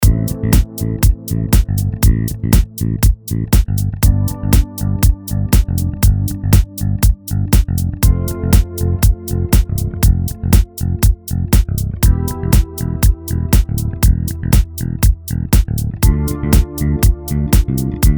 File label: bass persian loop